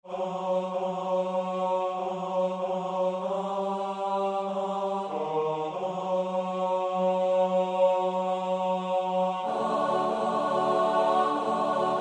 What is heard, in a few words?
S-A-T-B; engels